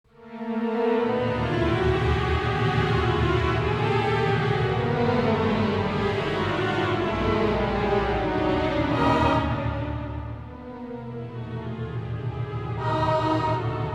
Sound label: classica